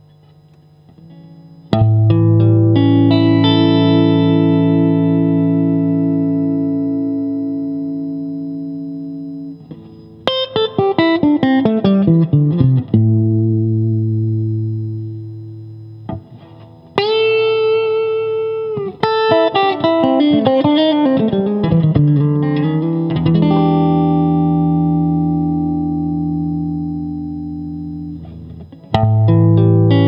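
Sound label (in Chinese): 60S MWS 美产铜线 清音 3档, acoustic guitar; kindman 60s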